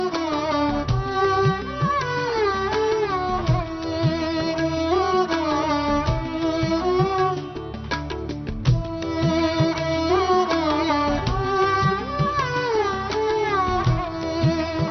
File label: Beautiful Tone